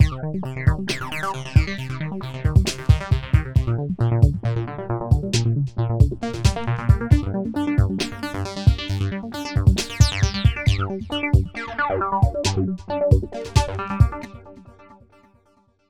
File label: loop drop